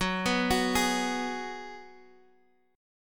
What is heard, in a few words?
Gsharp Minor 7th; 14,14,16,13,x,x